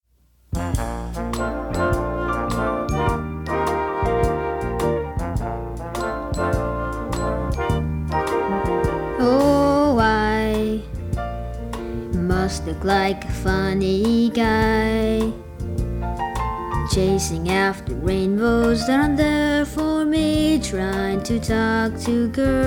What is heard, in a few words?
Duet